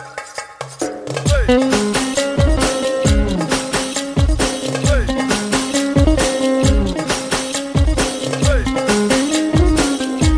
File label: guitar ringtone, bollywood